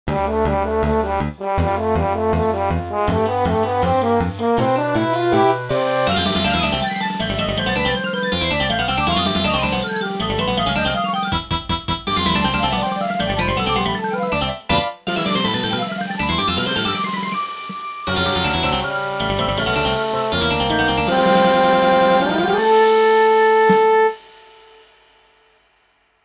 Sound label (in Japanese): ファンファーレ